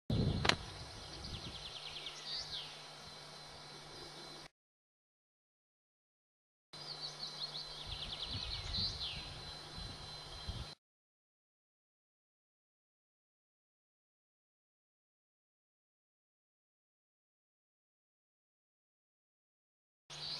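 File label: honey buzzard four long calls; kielder forest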